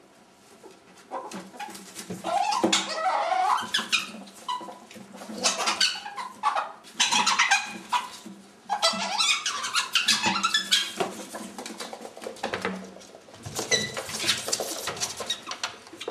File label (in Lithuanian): bendravimas draugystes nebus, ferret